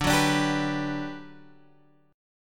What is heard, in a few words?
G Major 7th, D